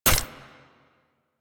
Jet Turbine Pistol Mech; Warframe; Tenno

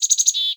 Forest Open Glade Bird Close, Day

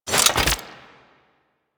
Spear Pickup, Weapons; Warframe; Corpus; Spear Gun

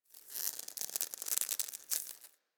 Tendon Stretch Small Close; Ambience; DE; Warframe; Infested Eris

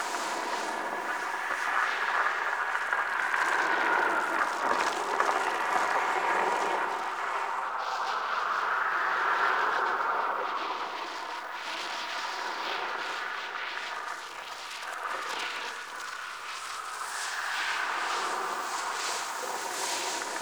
Transition Howl Wind Amb; Warframe; Corpus Gas City; Ambience

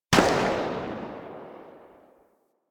Rifle Tail, Weapons; Drake

